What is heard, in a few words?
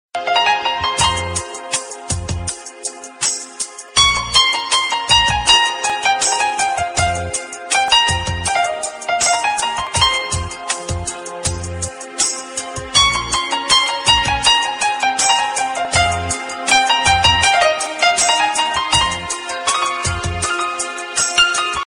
instrumental love ringtone; iphone